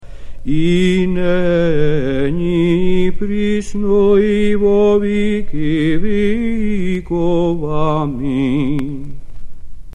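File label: Tone 3 Podoben Stichera Melody; Papp Irmologion; Krisoti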